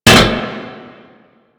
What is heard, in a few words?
Metal Pipe Drop; Warframe; Ambience; Nineteen Ninety Nine